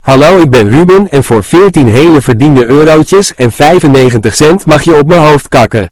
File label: bass boost